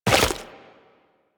Run Goo, Footsteps; Warframe; Tenno

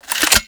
Four Barrel Rifle Mech Load

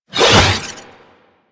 Sword Whoosh; DE; Warframe; Weapons; Sentient; Stalker Sentient Sword

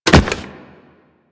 Land Soft Default, Footsteps; Enemies; Warframe; Teshin

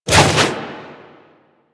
Flip Whoosh; Warframe; Teshin; Foley

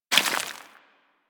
Bullet Impact Debris Rock; Warframe; Weapons

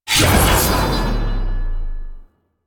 Backstab Cast; Warframe; Warframes; Pax Duviricus

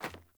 Sandals Run Metal, Footsteps

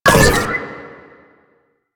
Console Deactivate; Warframe; The New War; Orb Vallis; Narmer

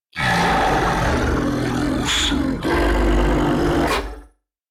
Octopede Taunt; Warframe

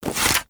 Staff Unequip; Warframe

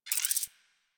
Scope Gun Zoom In; Crp Scope Gun

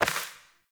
Sparrow Death State Impact; Animals

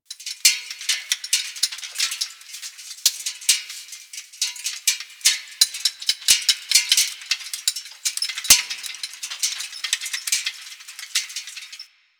Jetpack Run Rattle Loop; Warframe; DE; Vehicles; Veilbreaker

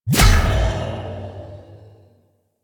Wisp Reverie Portal Appear; Warframe

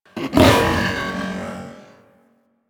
Jump Growl A; Enemies; Vocals; Warframe; The New War; Archon; Amar

